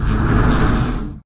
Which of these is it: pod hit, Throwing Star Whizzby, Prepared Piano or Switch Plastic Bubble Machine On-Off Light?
pod hit